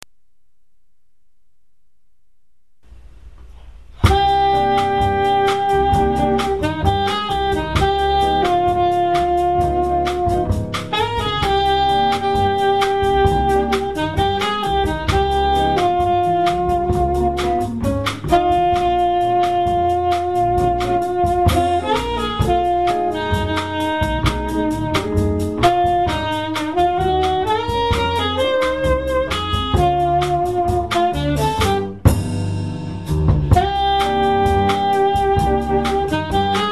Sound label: Bossa